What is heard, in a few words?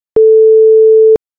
a4 tone